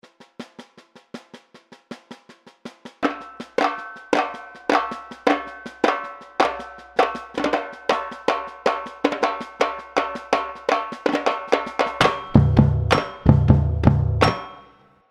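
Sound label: endbreak, samba; Pirate Samba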